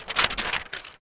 PAPER Shake mono; Don't Be Afraid The First Toy

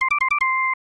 Flute Jingle; genesis gamerip 1994; The Pagemaster

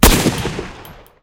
Sniper tower attack

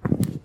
footsteps mat walk

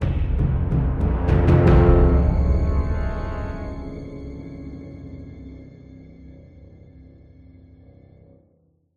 Sting Horror

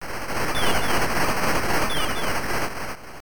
Crew Cheering; Relevos Snowboarding; MSX

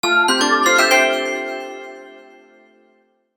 Your Turn Jingle

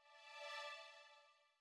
Loading (Jingle)

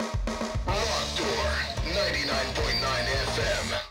Radio Rock Jingle; Gangstar Miami Vindication; android; macos

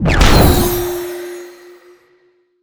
SFX spell harness magic

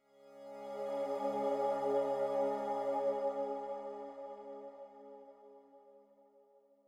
Calm Pad; super mario maker 2; Peaceful SFX Raws - Otoasobi

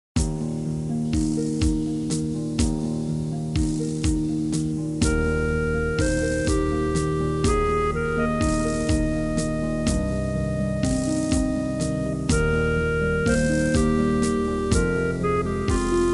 race; Galactic Civilizations II

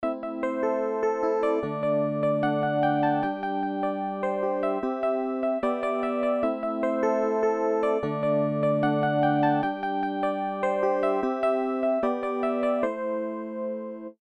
Piano Synth; Piano app by Yokee

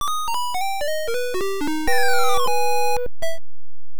Zapped; the tower of druaga; nes

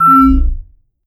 Panel Launchpad Diagnose Correct; Among Us

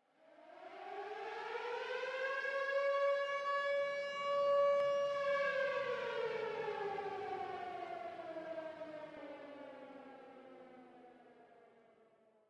Siren

